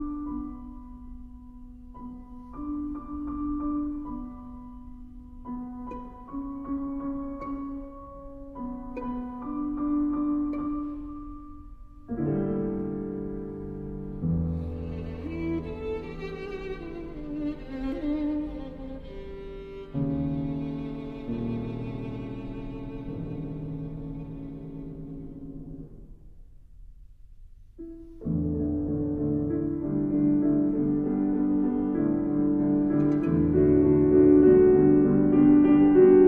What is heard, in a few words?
piano - Violin Sonata- Intermezzo; Mvmt 2